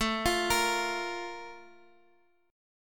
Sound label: Bb 5th A; Bb5/A arpeggiated